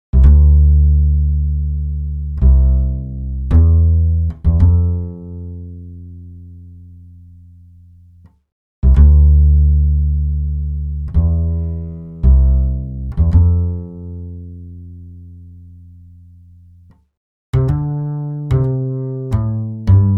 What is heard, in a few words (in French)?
fleur cachée contrabass